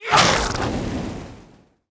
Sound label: poison plant attack, monster